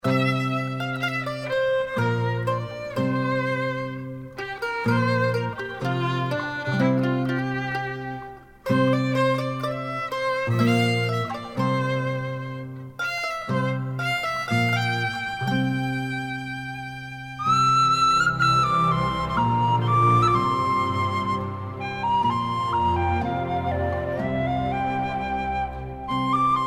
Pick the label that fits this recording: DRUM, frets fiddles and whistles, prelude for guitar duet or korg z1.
frets fiddles and whistles